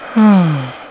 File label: SIGH